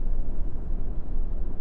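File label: ambience interior; de old industry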